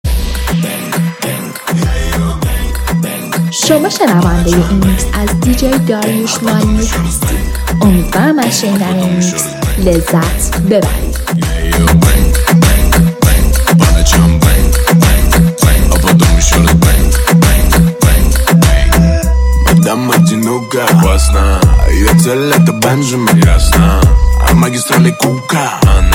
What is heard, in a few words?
gym training